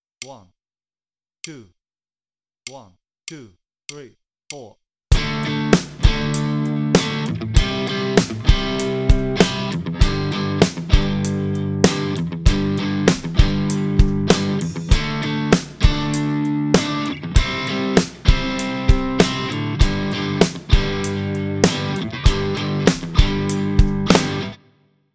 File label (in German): Play-along, Bass Unlimited